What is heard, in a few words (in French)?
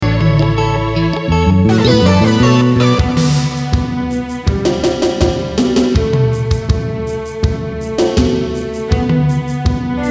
Surdo et general, Surdo dans l’arrangement; panoramique à droite; tambour traditionnel qui marque tous les deux temps.; le passage percussif (mesure 231…)